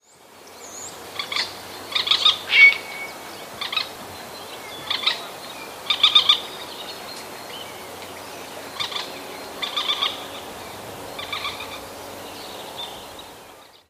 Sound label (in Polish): sojka; trudno określić charakter tego dziwnego popiskiwania